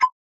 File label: click, ui; maxon